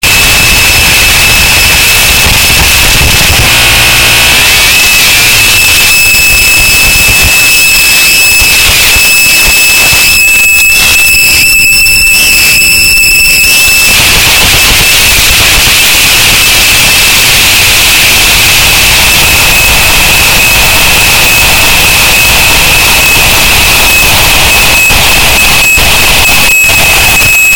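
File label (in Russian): dolgij zvuk bureniya skvazhini, long sound of well drilling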